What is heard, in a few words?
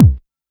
Quasimidi 309, Drums